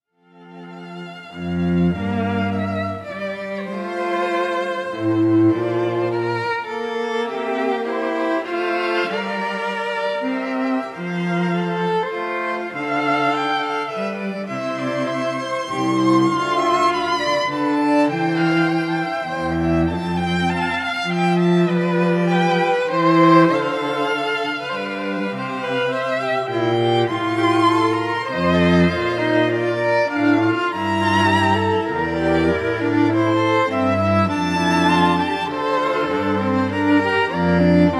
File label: Violin Concerto Largo; Bach Double Violin Concerto